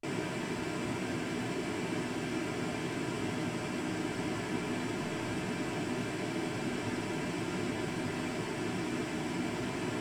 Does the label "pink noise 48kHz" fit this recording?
Yes